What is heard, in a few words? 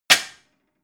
plate shelve hard